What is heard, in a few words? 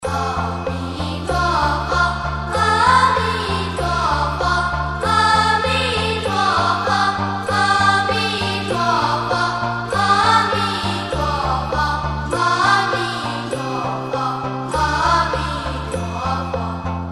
Amituofo kids voice 四字七音 童聲; Pure Land